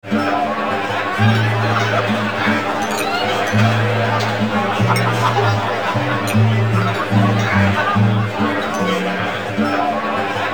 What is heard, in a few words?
Tavern; Heroes of Might and Magic: A Strategic Quest; 3DO; MS-DOS